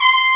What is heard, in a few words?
brass, sound canvas